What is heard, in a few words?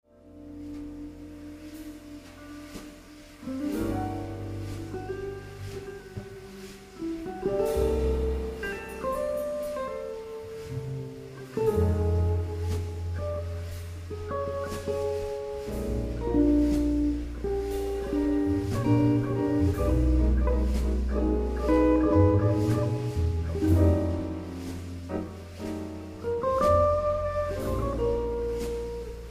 Swing